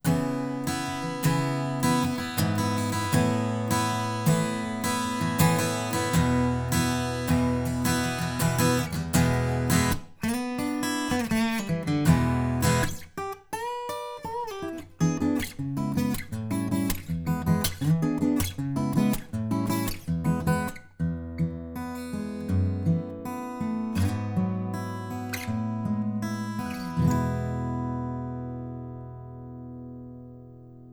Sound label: Ac Gtr